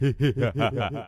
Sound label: evil laugh mini